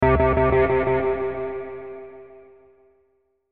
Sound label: Hit Synth Low Delay Reverb; Blastwave